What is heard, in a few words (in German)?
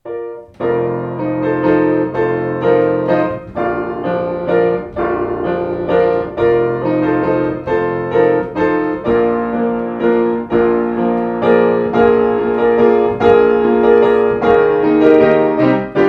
Schubert Deutscher Tanz, Klavier; Yamaha Klavier